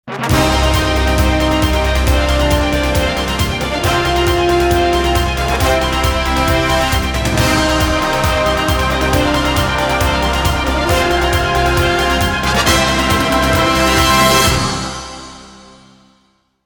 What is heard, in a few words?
brass bang